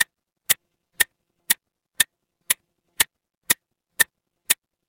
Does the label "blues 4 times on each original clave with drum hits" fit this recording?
No